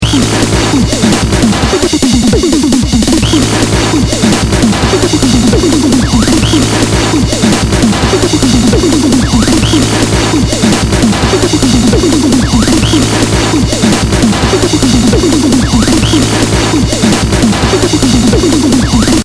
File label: drums